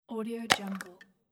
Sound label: glass jar lid unscrewing; mountainaudio